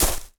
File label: grass, player steps; quake2